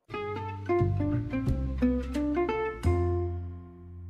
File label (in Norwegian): gitarjingle